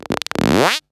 Synth Shots; Vengeance Samples

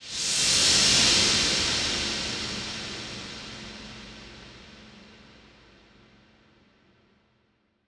Sound FX 138BPM; G#minor

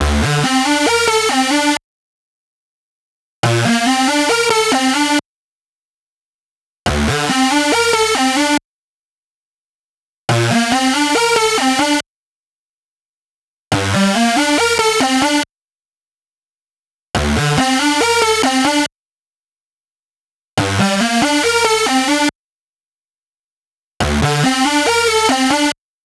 Mainlead DRY 140BPM, D minor; Bassline & Melody; Vengeance Samples; Beast Kit

